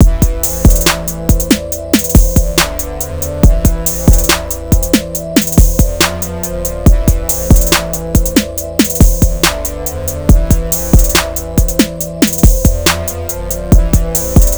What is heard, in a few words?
trap; LMMS